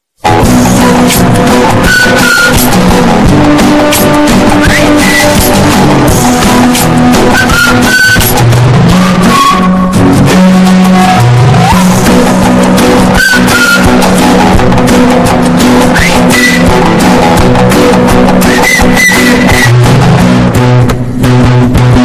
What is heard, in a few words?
bass booted; depicable me